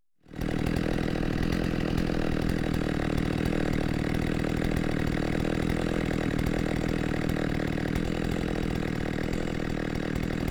Chain Saw; KMP Film Video Sound Effects

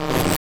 Static; Blastwave

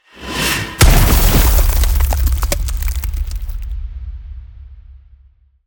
Ice Spell Explosion Impact Icicle Detonation; Ice Explosions & Impacts; Arctic Aura

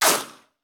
Bullet Ricochet; Sound Ideas; Guns

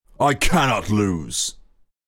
Lose; Pitch Black Audio Pong

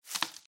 Tree Trunk; Blind Quest - The Frost Demon

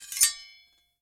SCRAPE Metal on Metal Cling Short; mono; Universal Sound FX; SCRAPES & SCRATCHES

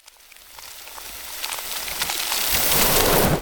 Ice Spell Power Up Time Manipulation; Power-Ups; Arctic Aura

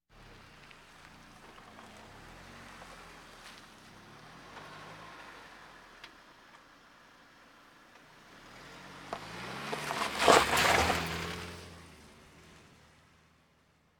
Automobiles various

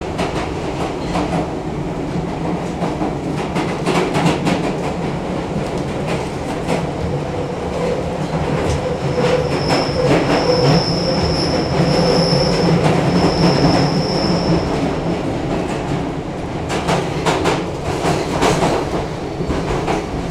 train subway london; best service Blue Box

